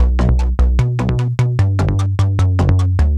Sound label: Bass Loop